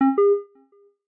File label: Charging Started Retro; Samsung; Galaxy A52s